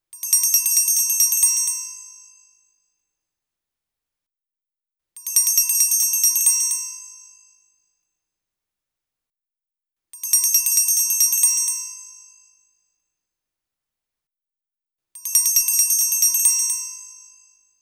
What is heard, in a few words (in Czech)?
Zvonek kratky; Studio Garage